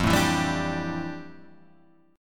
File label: F Major 13th Guitar Half Step Down